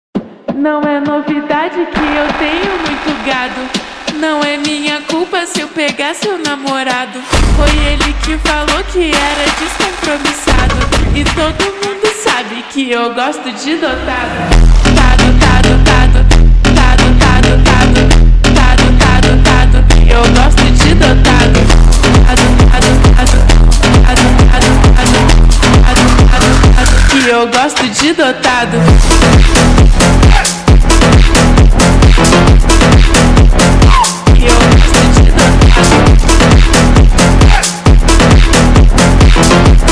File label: phonk br slowed reverb